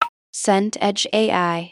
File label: woodblock hit